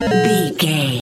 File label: retro coin pickup